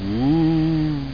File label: ghost; IQ2 Demo